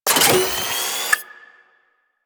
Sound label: Minigun Fire Charge; Spec Ops Minigun Agent